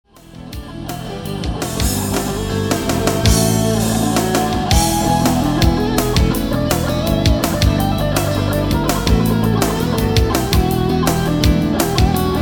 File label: carnaval rock